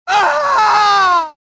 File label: death, prisoner